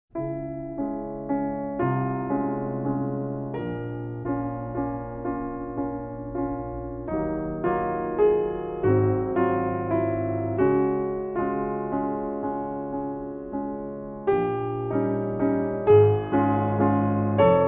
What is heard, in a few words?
Grand piano; P 173 Breeze; PETROF